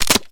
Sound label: Bullet Hits Wood Crac